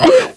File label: Stalker Pain